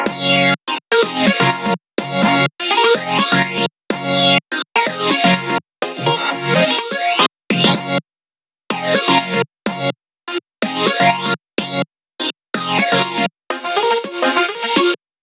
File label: C# Maj 125 BPM Resample; Cymatics